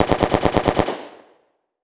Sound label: Weapon Machine Gun World War II Historical Long Burst; AdobeCC